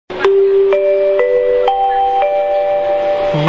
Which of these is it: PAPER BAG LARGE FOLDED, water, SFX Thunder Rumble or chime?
chime